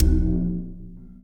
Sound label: click; ui; quit doto